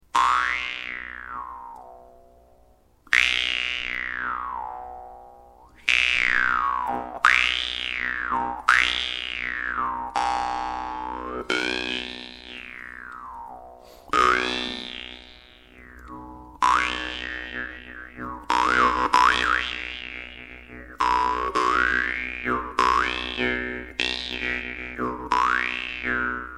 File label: vargan; russian vargan sklemin strela